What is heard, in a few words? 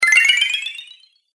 Magic Tokens Game Fx; Video Game Sound Effects